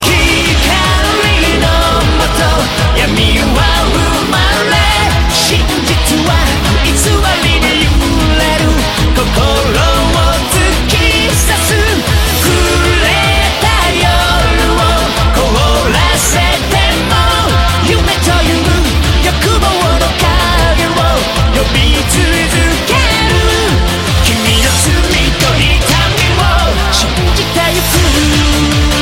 Chorus

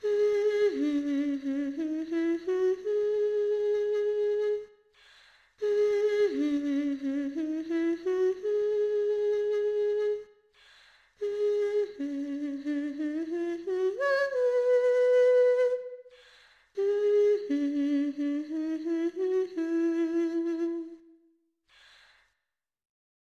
lullaby

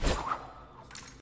Throwing Knife

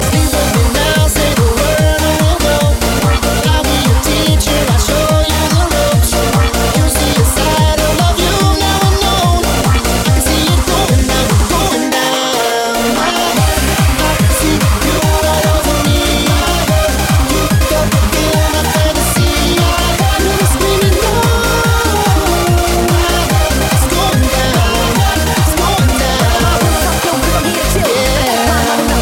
Dancemusic

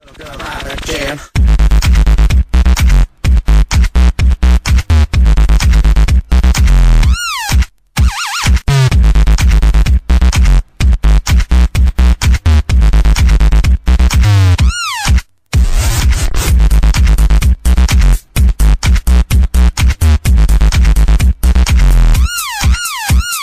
Tecktonik

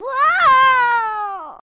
Wuaaaahhaaaaa

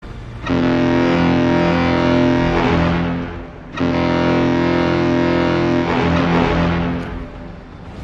Battleship Horn

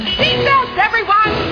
Seat Belts

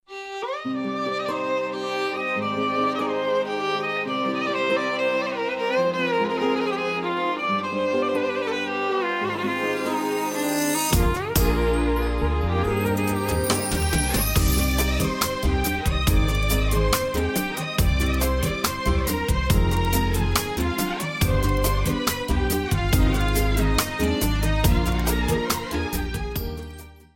Soft Violin

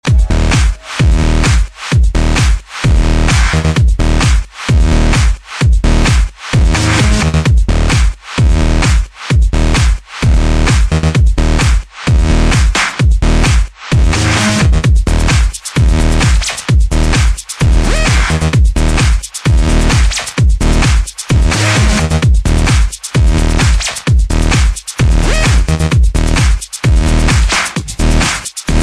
Dance Beat

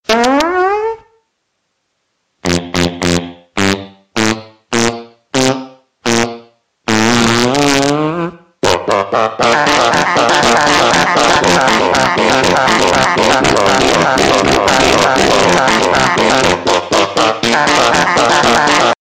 Fart Contest

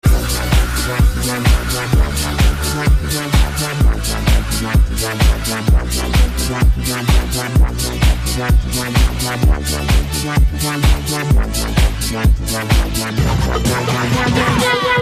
Small Techno Beat

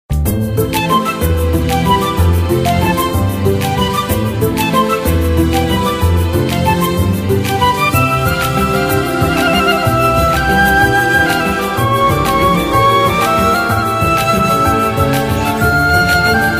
Interlude

